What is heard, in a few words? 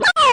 baby Bowser Yell; N64; YOSHISISLAND